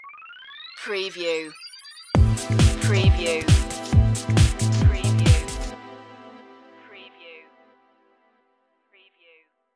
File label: smash house stinger